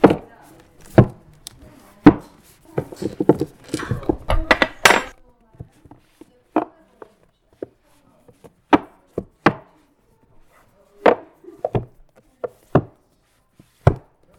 책 정리 소리

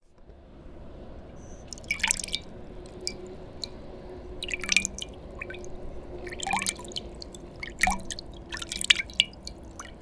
우주 파동

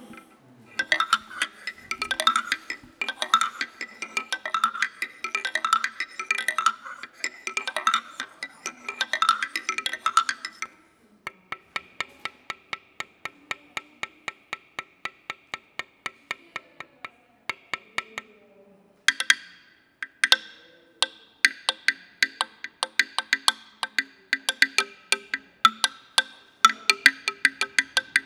방울치는소리

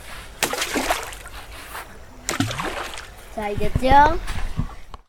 풍덩; beach

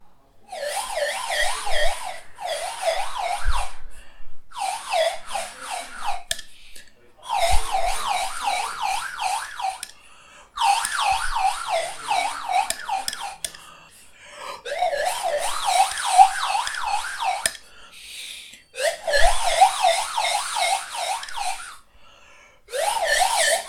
사이렌 피리

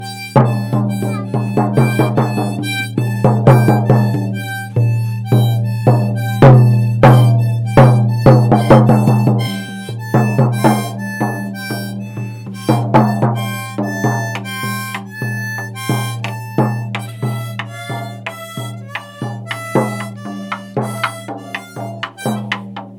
북 + 하모니카; beach

